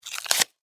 Paper Wrapper Rip, Office, Paper, Wrapper, Rip, Tear, Open; Offices